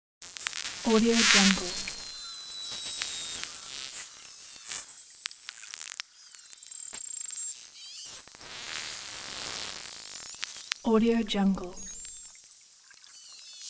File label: beluga whale clicks; hollywoodedge